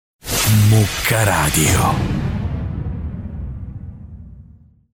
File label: jingles